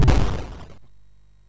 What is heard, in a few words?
MOS6581R2, sid; Defenders of the Earth